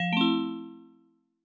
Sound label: ding